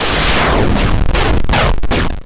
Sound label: cluster, explosions